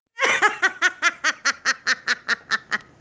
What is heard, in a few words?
laughing